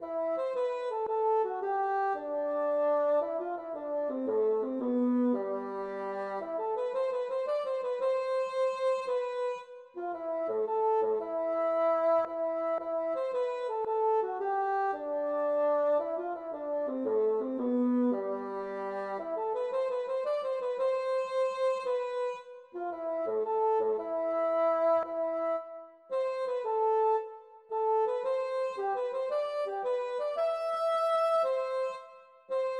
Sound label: mazurka